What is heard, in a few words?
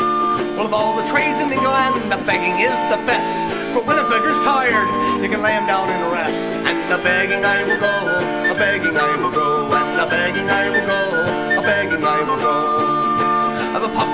abegging